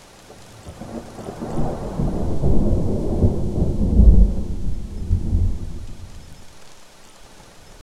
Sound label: crashing ocean waves howl